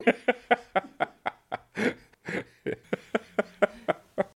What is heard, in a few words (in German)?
Lachen